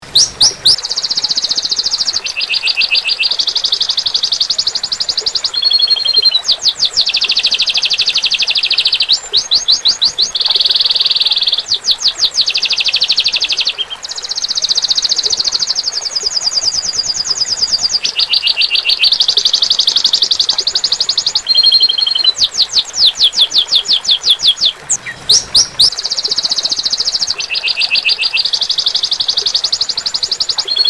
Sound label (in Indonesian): kenari standar